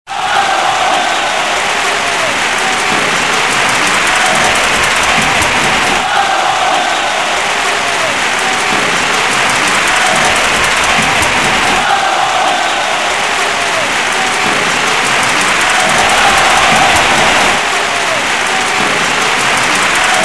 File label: crowd on stadium